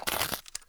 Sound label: m60 cardboard rip; mg; garrysmod